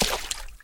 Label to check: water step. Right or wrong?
Right